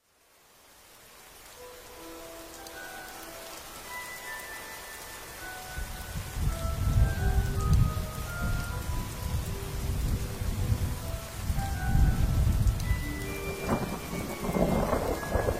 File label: Music Ambience Rainy Night; Harry Potter; Rainy Night at Hogwarts